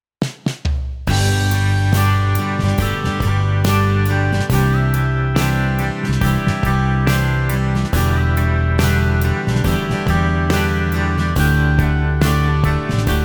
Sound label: guitar